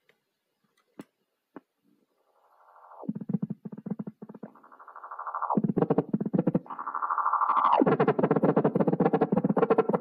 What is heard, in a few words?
Echo X Oscillation Build Up